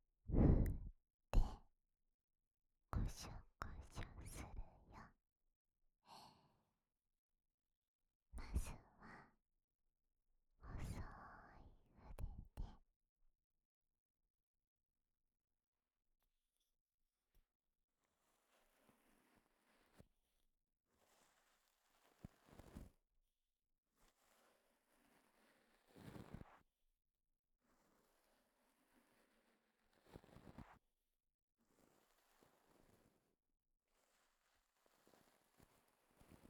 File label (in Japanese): 耳かき